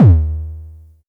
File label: High Kicks D#2; LIMAT Div Dept; Drums